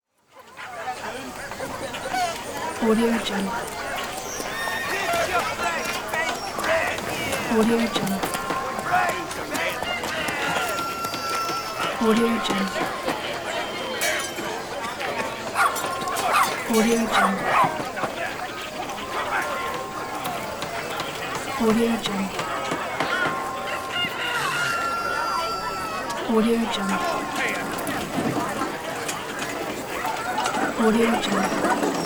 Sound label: medieval market; applehillstudios